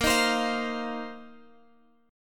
A sharp Suspended 2nd Suspended 4th A sharp, Guitar, Open G